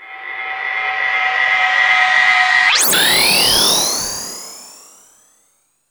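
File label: starship; SFX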